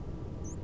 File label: chsp